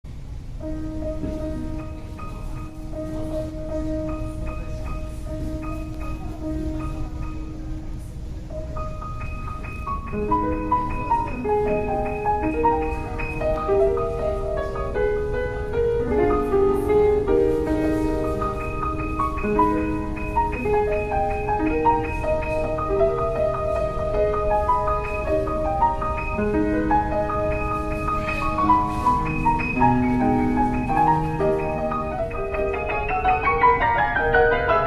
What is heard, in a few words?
Liszt La Campanella Rehearsal, piano, Hall; Reiko Tsumuraya